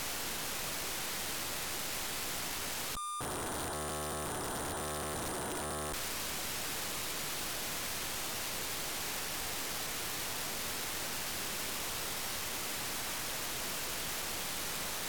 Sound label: pocsag ambulance; wirelessness